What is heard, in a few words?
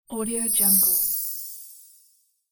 shine; audioheart